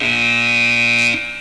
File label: Buzzer; Digital Video Editing Workshop; DVCAM; VidWave III; VideoWave